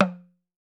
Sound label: TAM TAM, African Drums